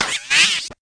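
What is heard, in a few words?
robot whine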